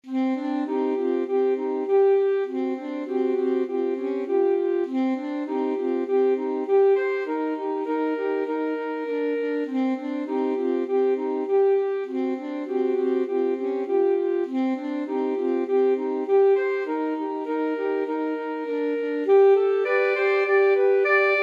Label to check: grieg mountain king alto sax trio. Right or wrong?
Right